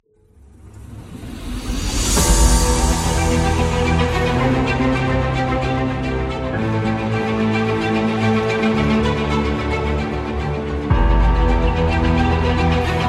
Epic Movie Trailer

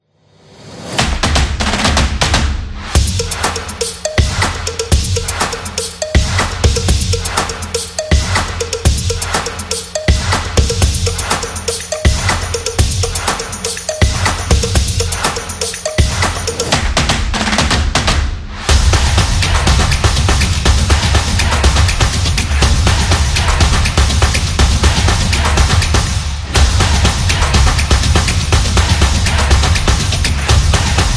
Opener

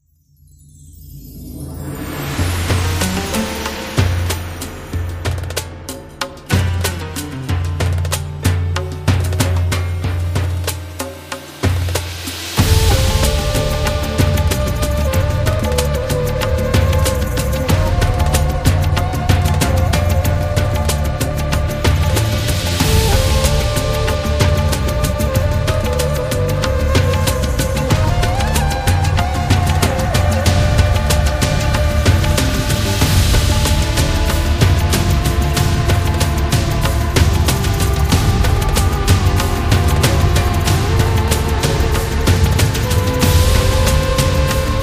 Arabic

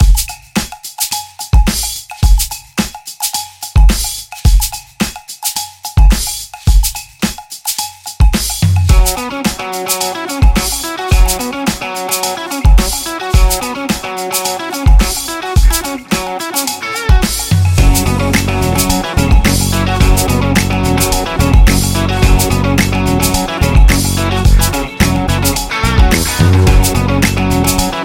Hip Hop Rock

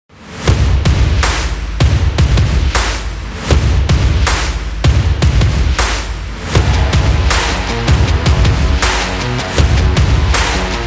Stomping Rock

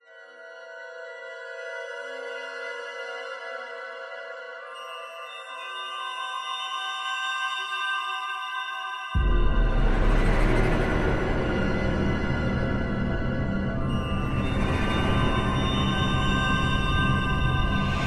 Tense

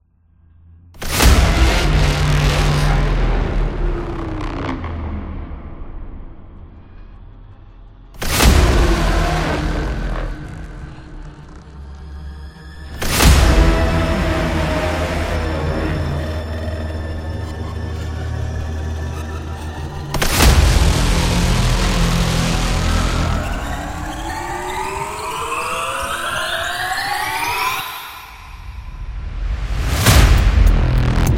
Dark; dramatic

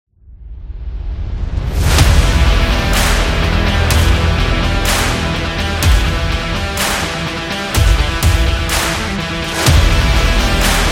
Action Rock, metal

